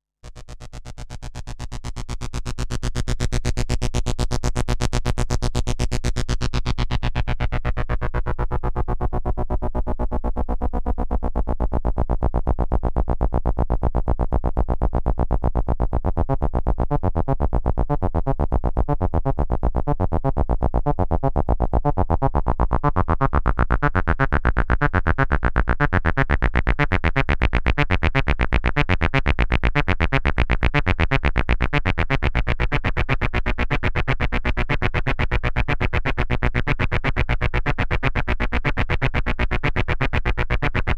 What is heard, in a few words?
Dreadbox Erebus Reissue